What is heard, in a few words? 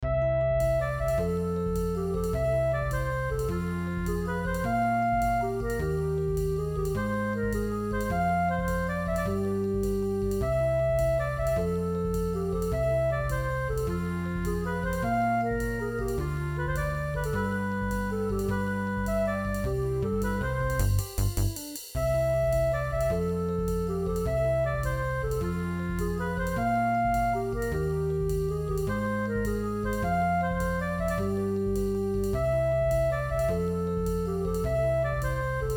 strange clarinet tempo 156